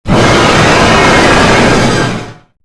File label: dra roar; PSO音源